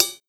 Ride Hit Short; Millo V3

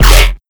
Kick Dist

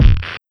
Kick; Stoner; pitchglitch samples

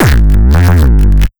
Kick Dist Guitar Amp; Granular Sumo

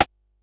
alt rimshot

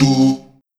Vocal Doo

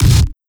Kick Dist Crispy

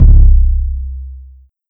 Kick House

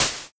Noise Noisy Snare

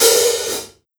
HiHat Foot; Millo V1

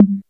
Tom Mid S; Synthie V1

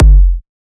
heavy

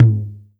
Tom High; BJAPacific

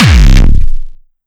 Kick Dist HL; Dagro

